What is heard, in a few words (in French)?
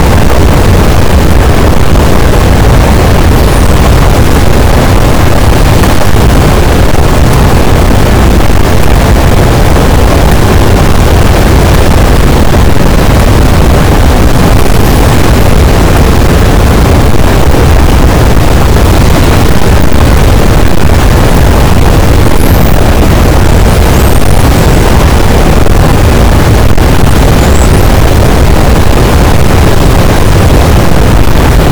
cachalot positif, boat, Physeter Macrocephalus